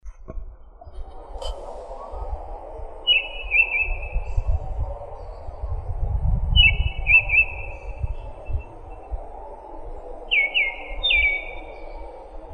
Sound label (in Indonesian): suara kicauan burung anis kuning